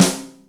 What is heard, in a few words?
Fat looz, Yamaha DTXpress Acoustic Snare